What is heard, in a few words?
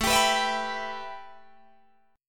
A 7th Suspended 2nd A, Guitar DADGAD; A7sus2; strummed